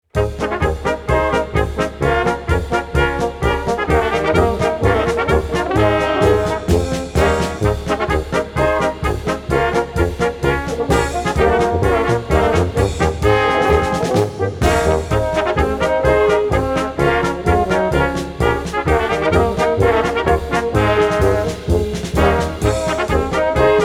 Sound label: Polka, NoFlute; Bayern